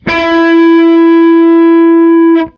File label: guit; TamTamMicro.activity